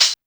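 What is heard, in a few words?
SHAKE closed hi-hat; Drums In Your FACE!; BONUS HATS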